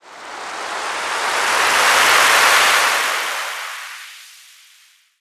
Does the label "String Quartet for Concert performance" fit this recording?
No